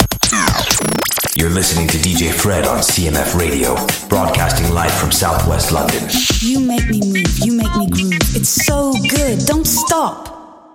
radio jingle

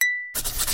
correct

